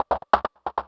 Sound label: eurorack